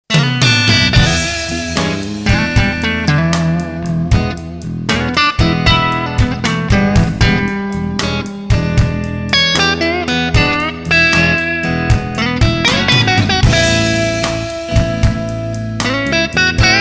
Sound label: Solo